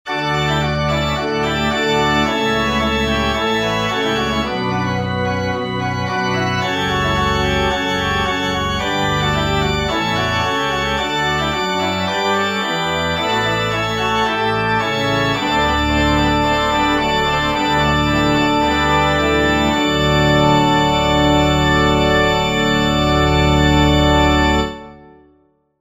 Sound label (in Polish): ORGANY; Chrystus Wodzem